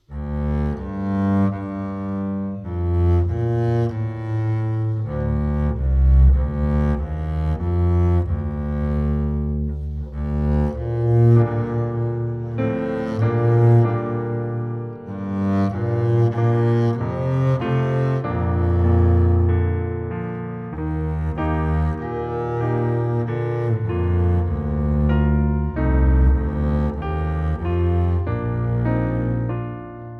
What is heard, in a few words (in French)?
contrebasse